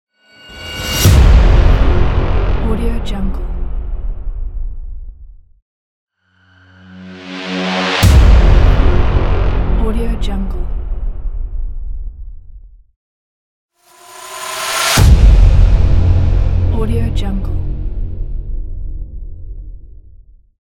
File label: epic horn